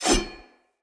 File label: Sword Whoosh; Weapons; Swords; Fenn Jotar Sword